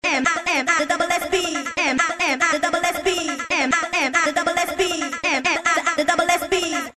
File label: accapella double beat